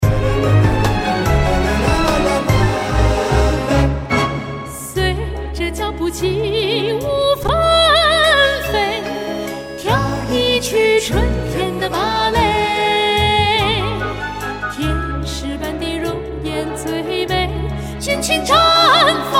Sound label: Viennese Waltz